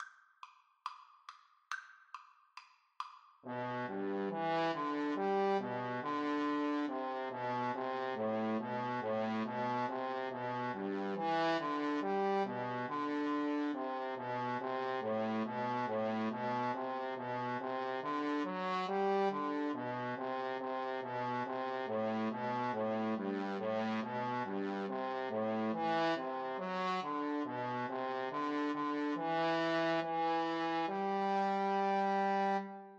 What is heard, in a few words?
Trombone Duet